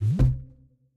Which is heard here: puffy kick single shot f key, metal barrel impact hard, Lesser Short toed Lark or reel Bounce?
reel Bounce